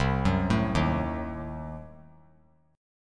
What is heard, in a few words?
C Diminished 7th down; arpeggiated; JGuitar